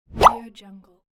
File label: pop appear